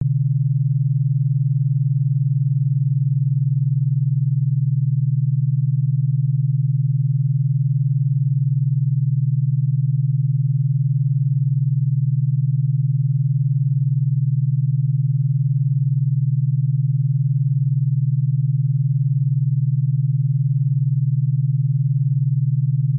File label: mid beta, binaural beats